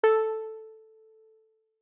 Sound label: Subsynth mod filter